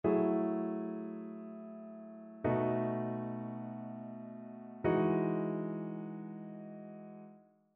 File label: ii V I progressions; ear training